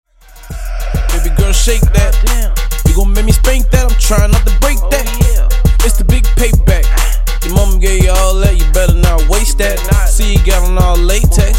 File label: Hype Redrum 102bpm